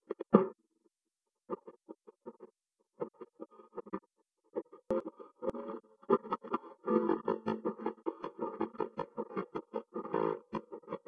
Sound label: synth